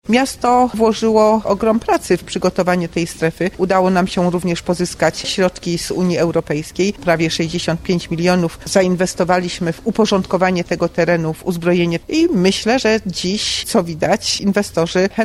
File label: grunt